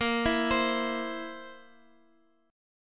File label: Bb Suspended 2nd Flat 5th, Guitar; arpeggiated